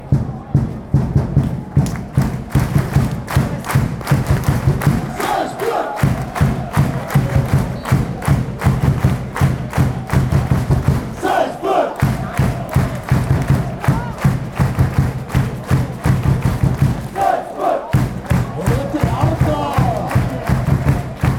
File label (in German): salzburg mit trommeln, chants